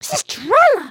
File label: excited, emotes